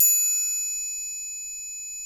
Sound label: TRIANGLE; InVision Interactive; Lightware VOL-1